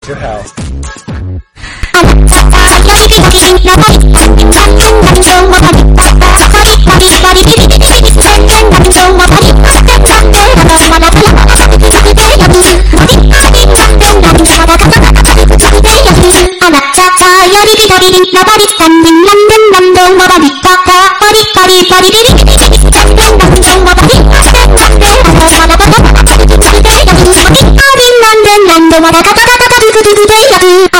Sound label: funk remix